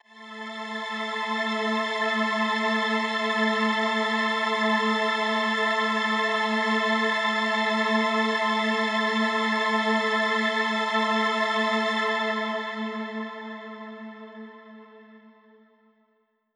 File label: Mach String A min, synth orchestra